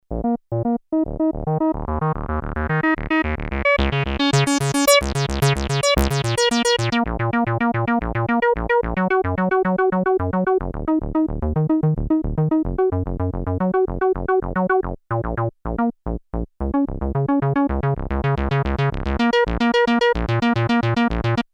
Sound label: solo, Cyclone Analogic TT303 Bass Bot; the internal CPU manages 1 voices with 2 different waveforms: saw and square